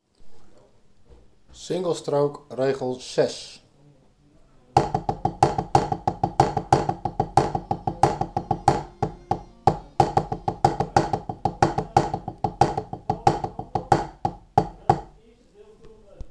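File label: Single stroke roll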